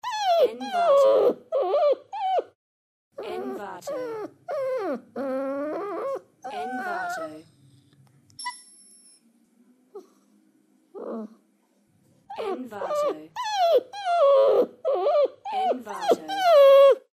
puppy crying

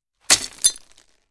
bullet impact

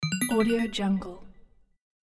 low tone message

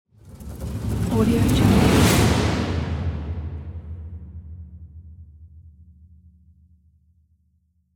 powerful trailer horn

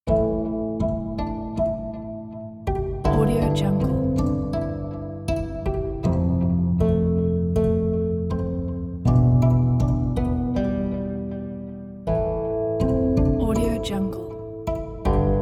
koto and erhu

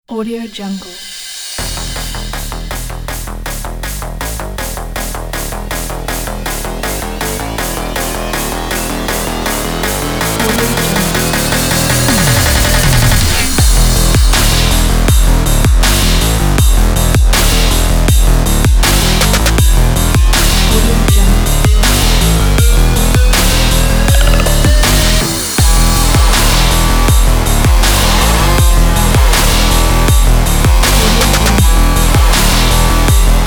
hard aggressive dubstep

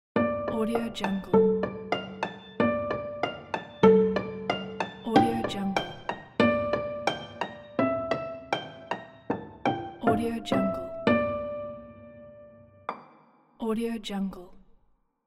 miniature dance dolls ident

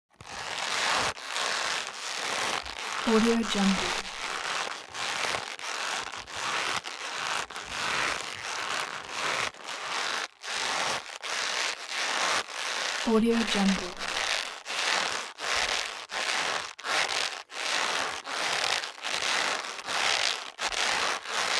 object leather creak sequence medium speed

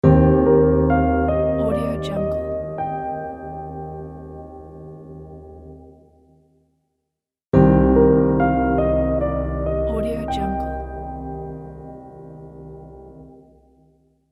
dreamy transition

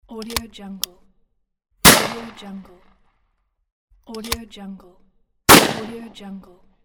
rifle shot

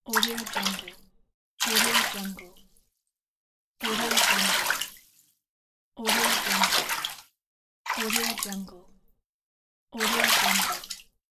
water splash

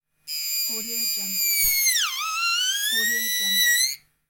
saw

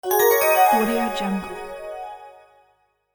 magic spell

